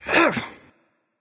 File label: grunt jump; cupid